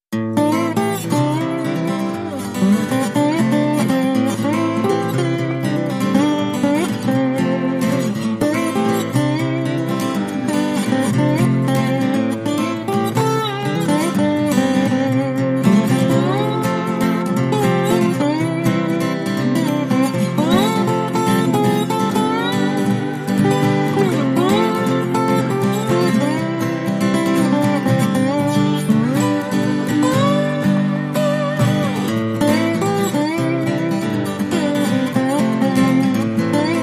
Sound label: Happy Tom, No Drums And Bass